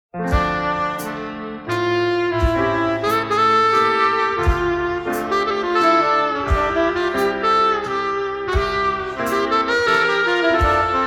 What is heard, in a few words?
Piano, Duet Saxophone & Drums